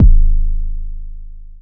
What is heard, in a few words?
BD, Drum Machines